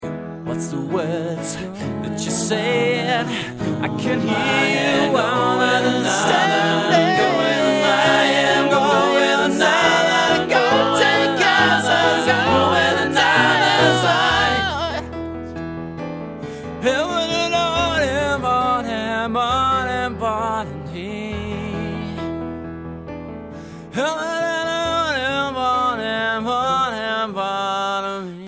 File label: pirate ragtime